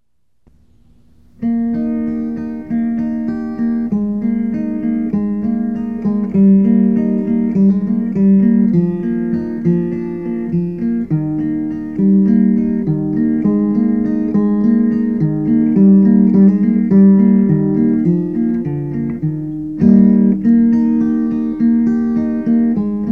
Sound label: rings